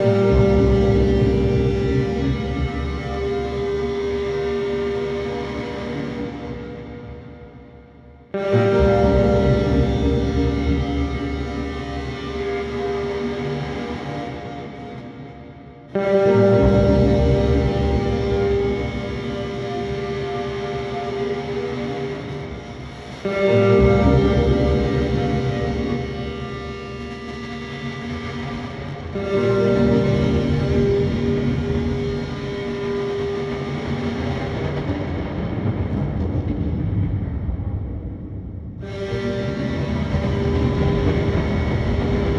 Poly Effects Beebo Bitmangler Acid

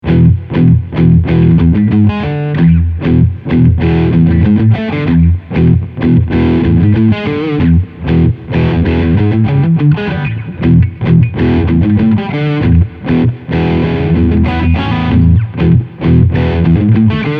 Overdrive Bass FULL High 12

